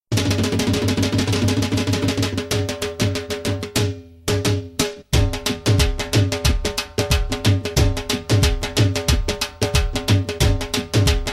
Dhol Rhythm; Punjabi

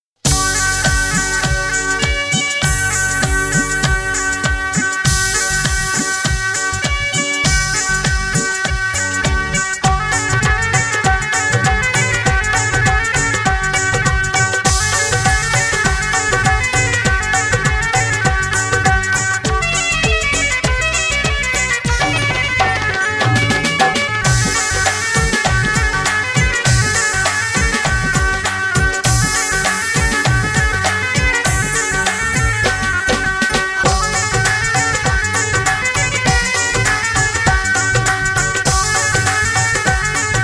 Instrumental; Punjabi

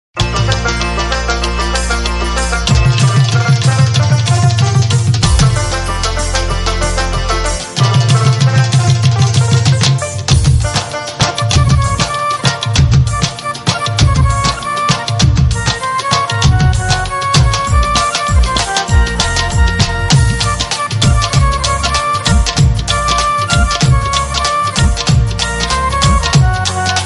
Intro Instrumental, Punjabi

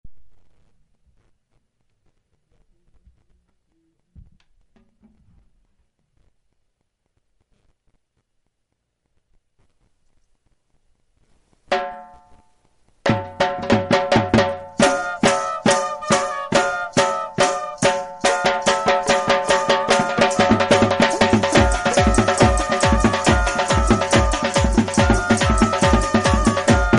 Folk Beat Instrumental, Punjabi

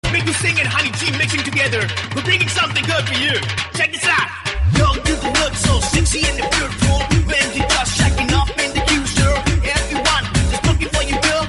Dhol Beat, Punjabi

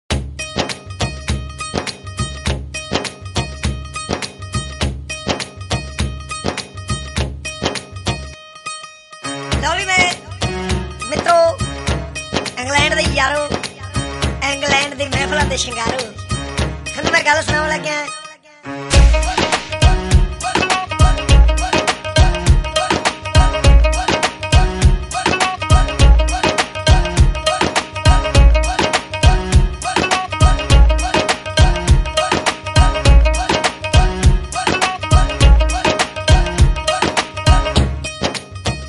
on Dance Floor Instr; Punjabi

